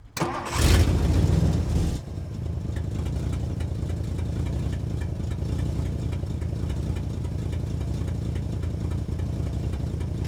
Ext Drive Med Strt Idle Away Distant Stop, 1964 Mercury Montclair; AUTO; Secret Source